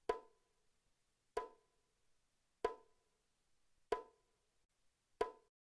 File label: Drum Rhythm Intro